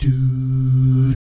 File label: DOO SFZ, Jazz